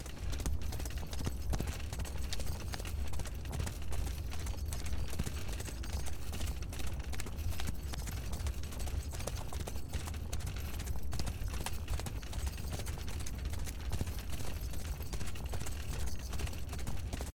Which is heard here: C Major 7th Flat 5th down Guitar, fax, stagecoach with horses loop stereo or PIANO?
stagecoach with horses loop stereo